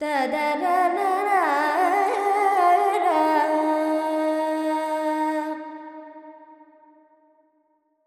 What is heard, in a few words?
Carnatic Female Alaps, Lydian, 70 G, Verb; Sample Banks